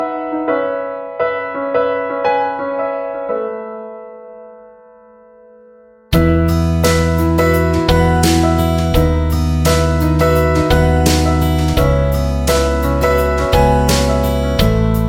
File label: piano; Game Of Thrones